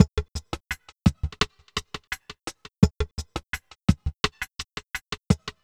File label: Drumloops, 170bpm; Zero-G; Total Drum Bass